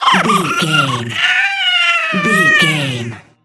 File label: goblin fairy death intense